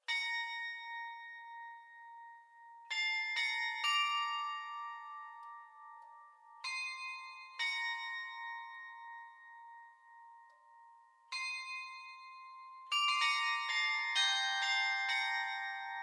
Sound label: Ave Maria Glockenspiel leise; Grassmayr